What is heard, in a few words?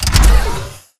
Mvmt FS Land Default; AI Stalker; Titanfall 2